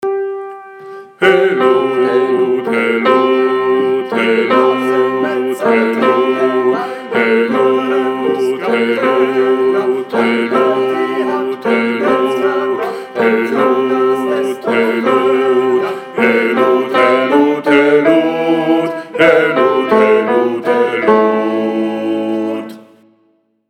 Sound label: BASS; Gesang; Helut ihr zecher